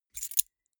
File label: key ring small grab